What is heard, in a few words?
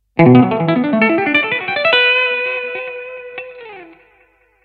Lick, MODERN TAPPING; Lick 1; Contemporary Rhythm & Harmony 21; Andrea Balestra; qualche lick